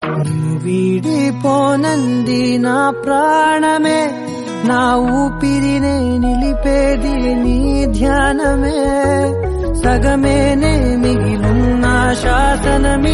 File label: Telugu